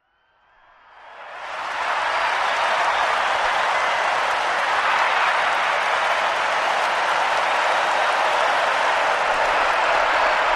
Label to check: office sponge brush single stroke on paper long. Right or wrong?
Wrong